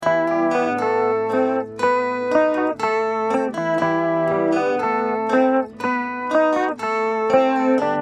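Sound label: acoustic guitar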